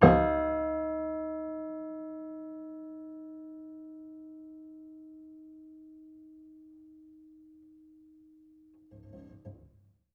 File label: Piano Harmonics, Harm Low Mid